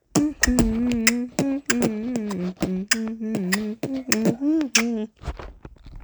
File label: national anthem beatbox